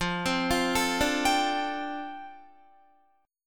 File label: F 6th Add 9th F down Guitar, chord; F6add9 arpeggiated